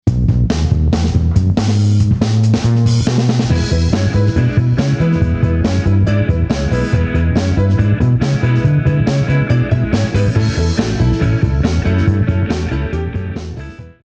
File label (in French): ROCK; Les styles de musique